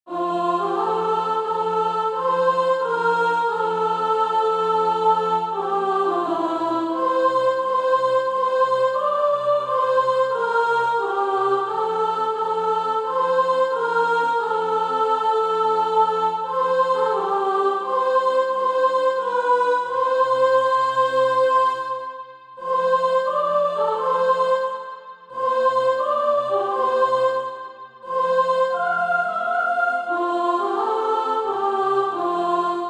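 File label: soprano, Practise Music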